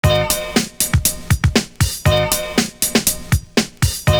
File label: WITH A STRAT, LOOP; Univers Sons